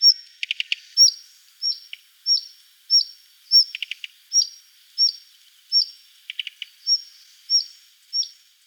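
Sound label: siniy solovey golos, Siberian blue robin voice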